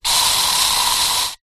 household aerosol spray insect killer empty spray long